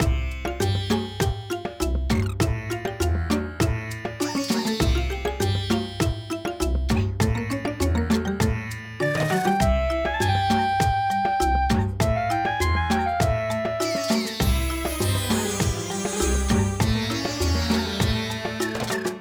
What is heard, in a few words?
Lobby; Cookie Run OvenBreak; Lobby Ovenbreak3